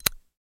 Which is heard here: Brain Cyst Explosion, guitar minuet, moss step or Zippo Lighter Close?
Zippo Lighter Close